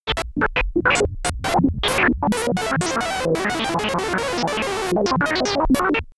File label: disturbed communication